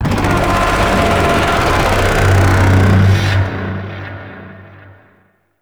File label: Void Dragon Vocal Idle; Warframe; Duviri